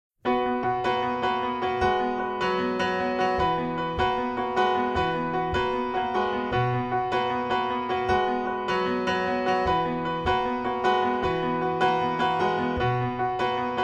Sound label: Accompaniment